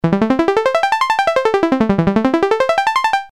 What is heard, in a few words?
arp; アルペジオ; U&D（上昇下降）